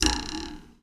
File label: Springboard